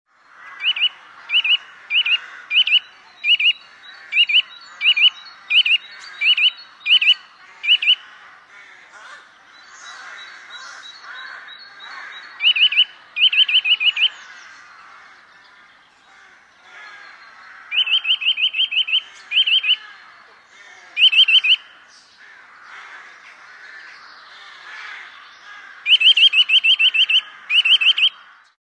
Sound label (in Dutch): Boomklever; broedvogels